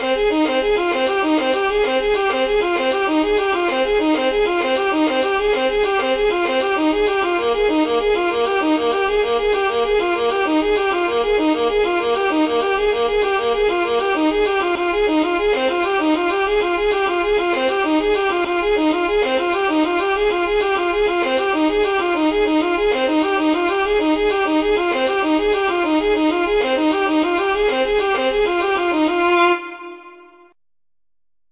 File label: Jig; The Poplars